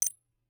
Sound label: ui hover click scoop up